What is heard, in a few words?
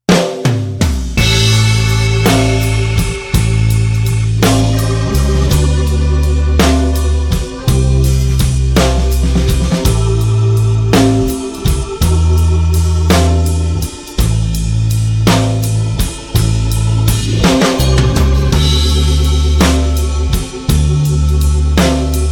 Blues